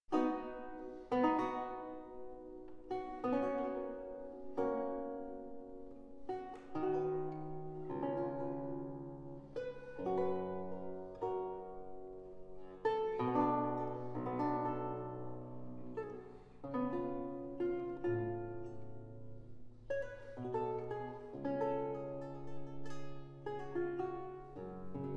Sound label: Sarabande; La Bergere